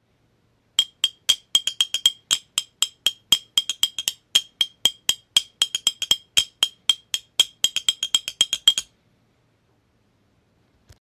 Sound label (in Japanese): ビン